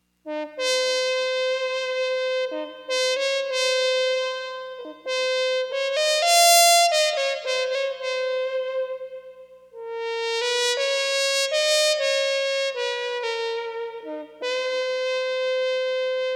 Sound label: Nord Harmon Trumpet; wind sounds